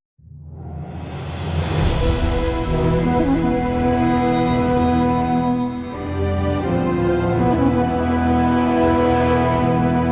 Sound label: boss battle; lifepools